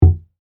Impact Wood Box, Impact, Wood, Apple, Box, Hit, Thump; Materials